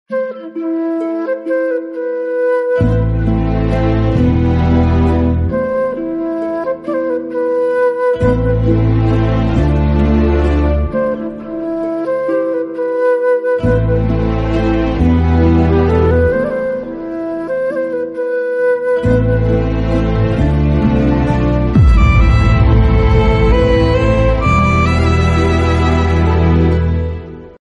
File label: BGM Instrumental; Pazham Proposes To Shobana; Thiruchitrambalam